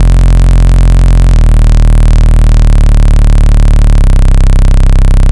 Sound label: Saw bass; Valvesque